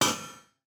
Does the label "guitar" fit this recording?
No